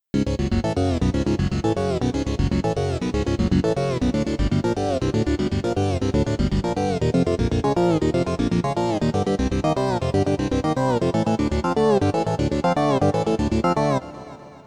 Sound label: eight steps groove